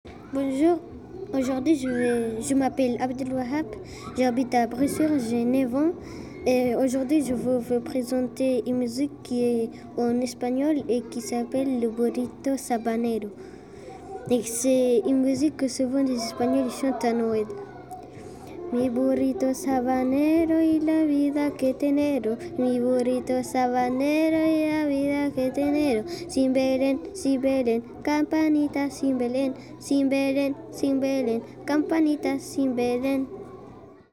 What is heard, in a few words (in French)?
chant noel espagnol